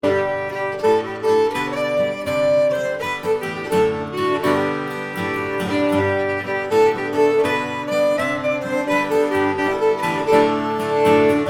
Piano

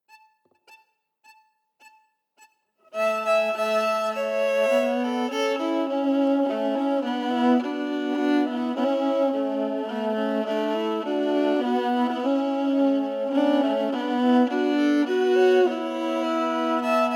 Stamey Creek harmonies emphasized

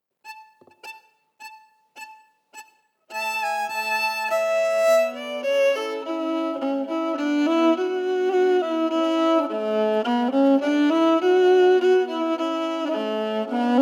Stamey Creek melody emphasized

